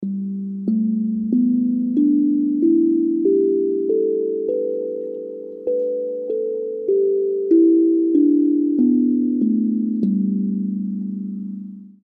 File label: Hapi Drum; Perc-melodisch